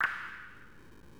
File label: Switch Track